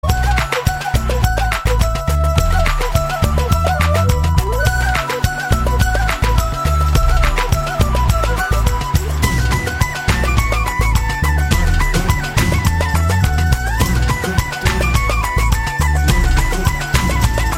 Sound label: Flute